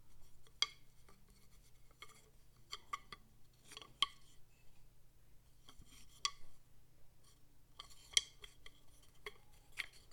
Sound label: Drumsticks